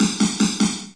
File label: FLANGED, Kawai R50; DRUM MACHINES